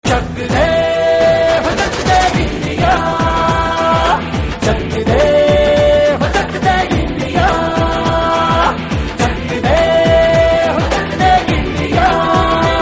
Cheer India

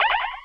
Photon torpedo

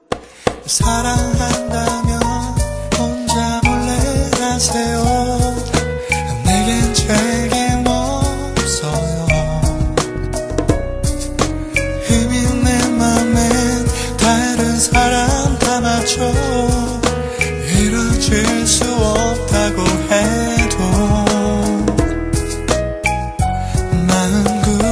Sad Love Story

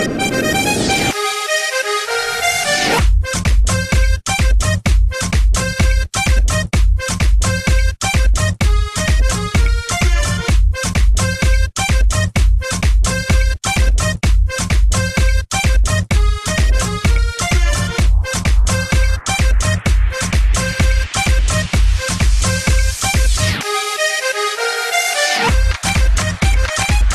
Akordeon Latino

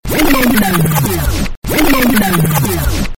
Dj Rewind

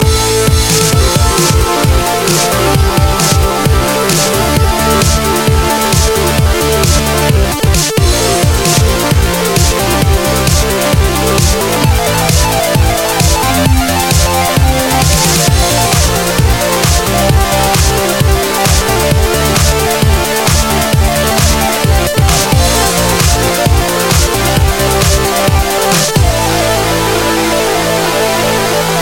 Electronica

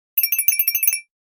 Twinkle

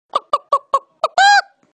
Chicken

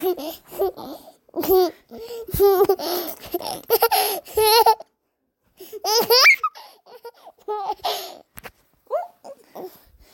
Giggling baby

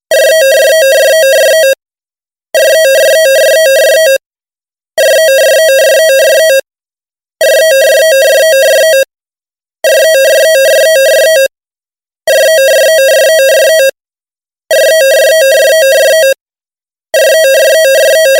Office phone

